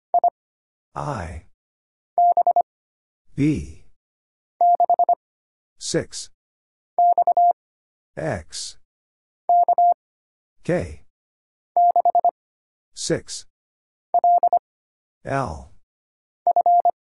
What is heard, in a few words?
Single Character (Letter Number Punctuation Prosign) - 25wpm, morse code practice